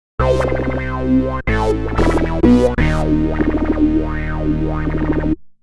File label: BASS